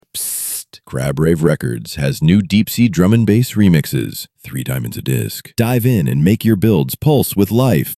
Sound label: Jingle; Crab Rave Records